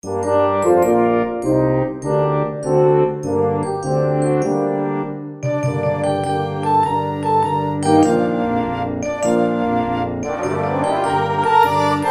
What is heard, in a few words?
Orchestra